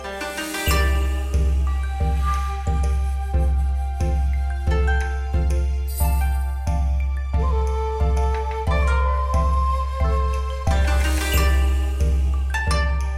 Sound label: bgm loop; xunmi; maj-soul